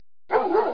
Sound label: WOOF